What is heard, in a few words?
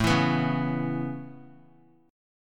A Major Add 9th A, chord; Aadd9 strummed; JGuitar